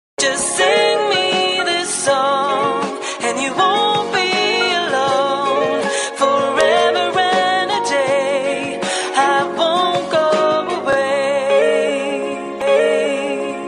Yearn Nokia

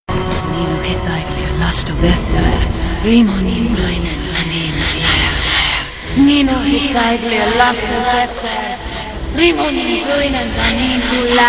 Water Spell; Arwen

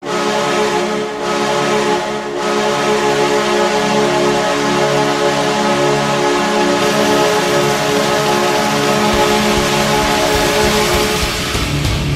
Goal Horn

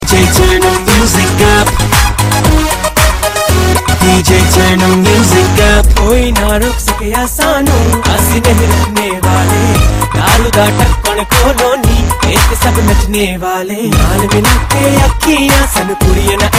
Punjabi

